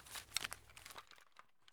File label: holster; Escape from Tarkov